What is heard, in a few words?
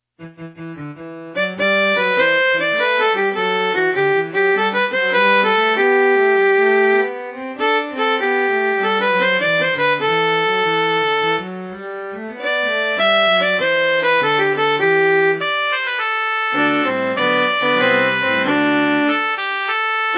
alto; pfautsch